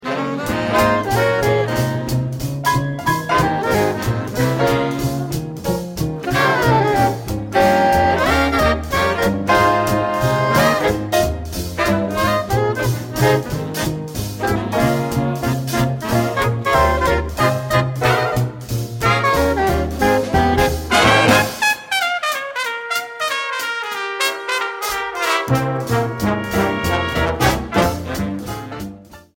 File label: Jingle Bells; A Christmas Special CD